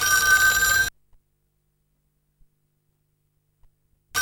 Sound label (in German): Telefon, med; 1990 - 1993er Edition